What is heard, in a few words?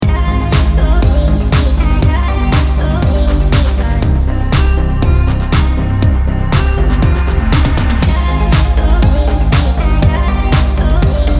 Loop vocal